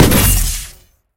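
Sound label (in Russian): запуск лазерного светового потока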